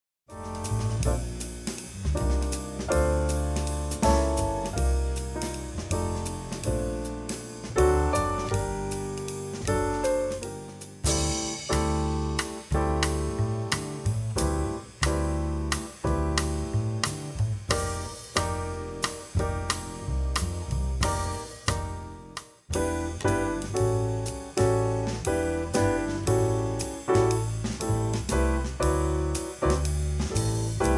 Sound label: Odd Meter Trio